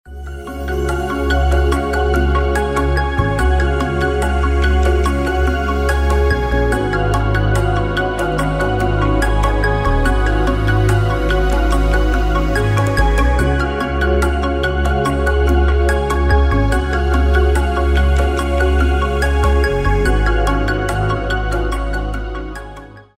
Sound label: Corporate